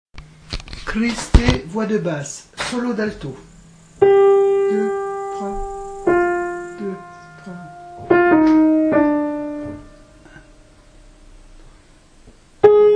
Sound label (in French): Christe, Basse; Niccolò Jommelli, Missa pro defunctis